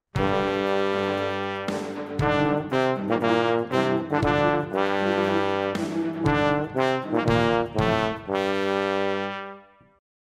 trombone

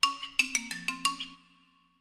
tickety tock; samsung touchwiz

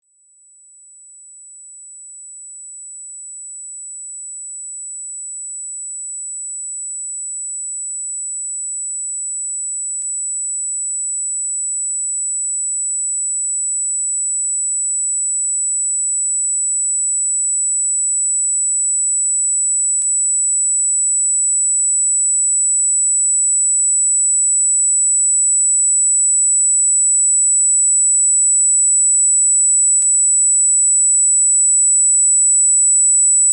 cat

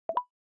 unlock

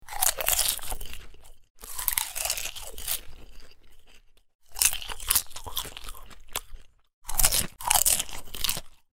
pop

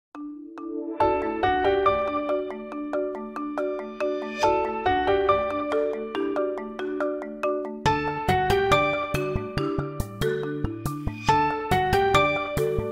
huawei tune harmony

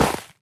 snow golem hurt, minecraft; snezhnogo golema

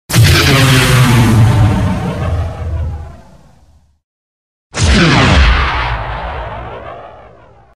ion blaster

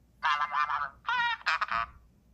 robot, iphone os

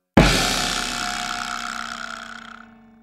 ding

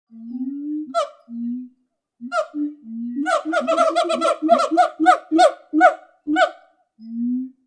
gibbon

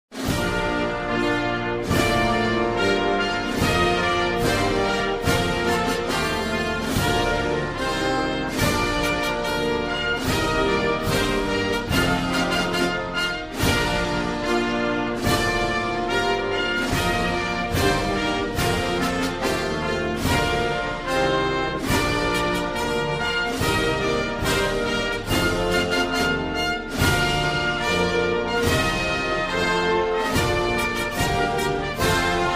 anthem

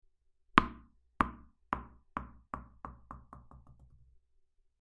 tennis

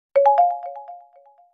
echo, huawei harmonyos

